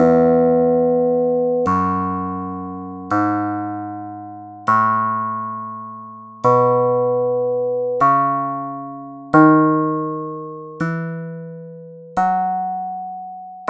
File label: guitar